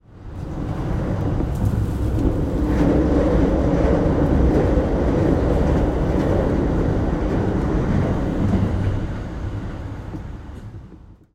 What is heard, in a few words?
train